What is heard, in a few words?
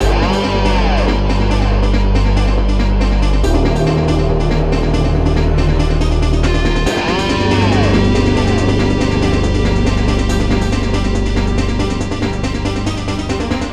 Big Boss Blues; donkey kong country 3 fan remastered